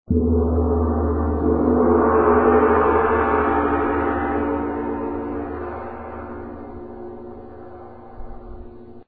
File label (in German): fen, Gong